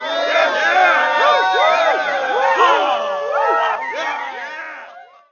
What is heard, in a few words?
beer cheer